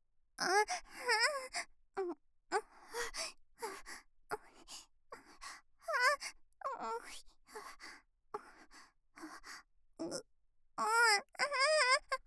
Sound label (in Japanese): 漣の喘ぎ声